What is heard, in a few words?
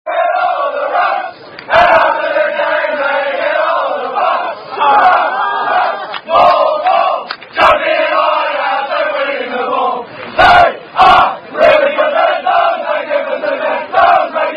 Sing Victory Song; Bingham Cup 2008 Sydney Convicts